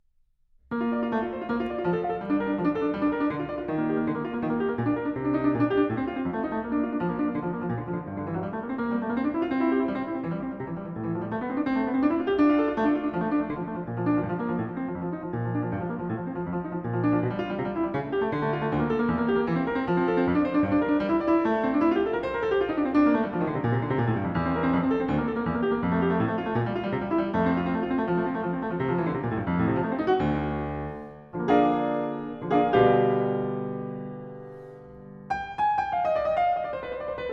Prelude No. 21 in B-flat major, Well-Tempered Clavier; BWV 866; Bach; Book 1